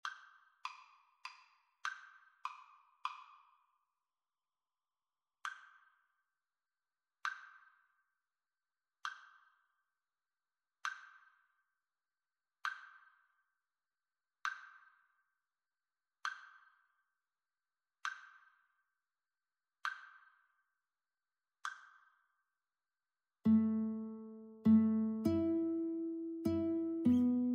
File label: Guitar Duet